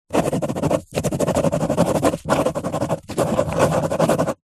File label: office pen ballpoint paper hollow table write scribble; zapsplat